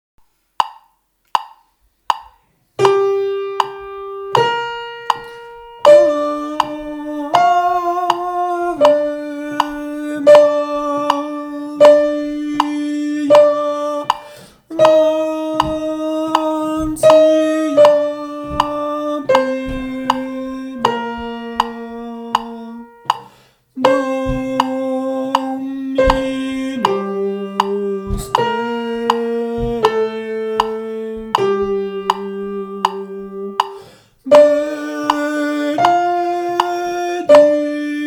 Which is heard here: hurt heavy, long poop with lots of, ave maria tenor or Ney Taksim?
ave maria tenor